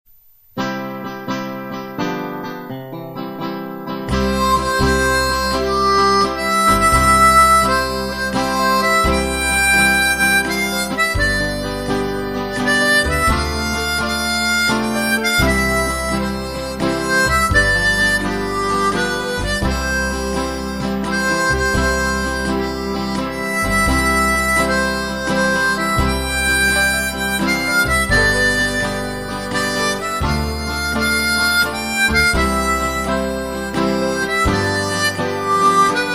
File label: country